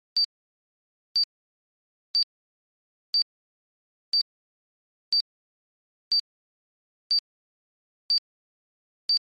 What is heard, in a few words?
Digital Timer Type 1 Ten Count